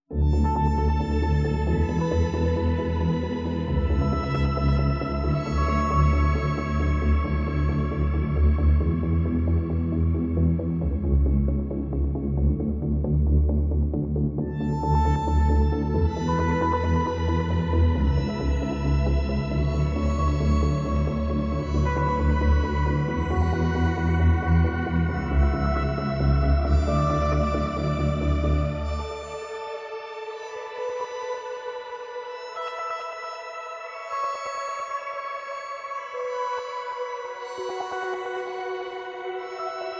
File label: Ambient Mix